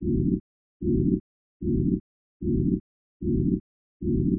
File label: Noise LF 6k; Sound Illustrations